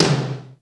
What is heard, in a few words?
MID TOMTOM, D110 DRUMS; Crypton - DrumBase5000